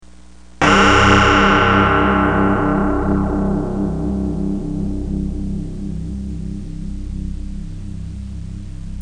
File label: laser harp